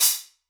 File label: Sparkle Steam Engine C, Hats; Airwindows